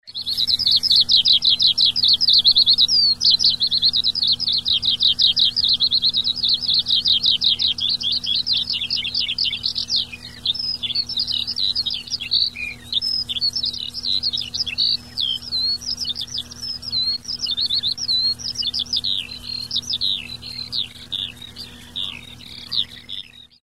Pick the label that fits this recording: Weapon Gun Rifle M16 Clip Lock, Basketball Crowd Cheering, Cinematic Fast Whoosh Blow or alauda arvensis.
alauda arvensis